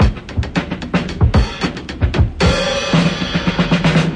dirty loose and jamming, 112 bpm, drum loops and breaks